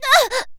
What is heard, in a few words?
die; Eternal Return; Tia; ENG